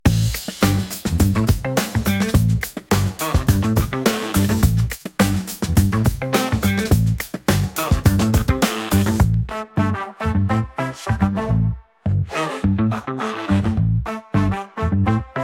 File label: Funky, free beats